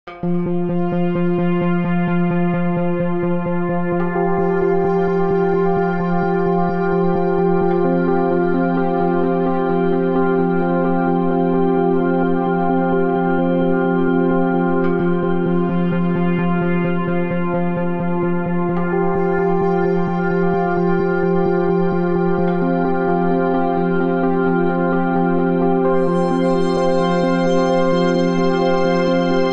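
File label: Tense Notes, F minor 130 bpm; MIDI Clips; Tonal; Motifs; Ableton; Mood Reel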